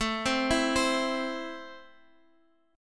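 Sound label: A Minor, Guitar Half Step Down, chord strum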